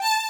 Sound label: violin